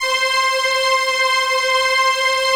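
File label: PAD, atmospheric pads; Best Service; XX Large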